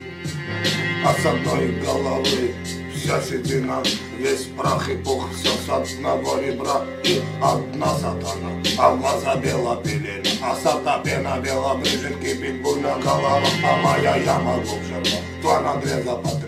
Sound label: ringtone